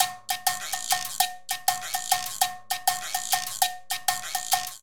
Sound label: Bomba Guiro Cowbell-199bpm; Latin Percussion; Ableton; Factory Packs